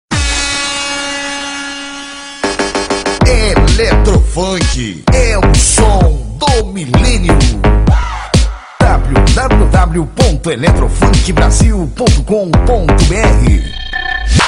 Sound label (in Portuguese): eletrofunk brasil